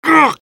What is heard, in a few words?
sniper pain sharp; vo